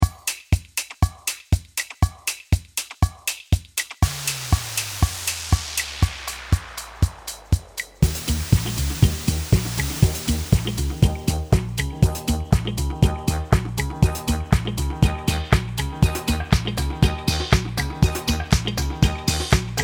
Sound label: Disco tech